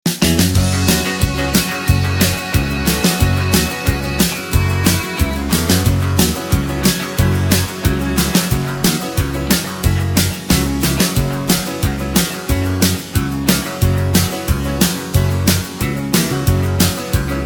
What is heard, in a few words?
Gb No Backing Vocals, backing tracks